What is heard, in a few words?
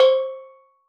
Steel Drums Layer C5, Calypso Steel Drum; Percussion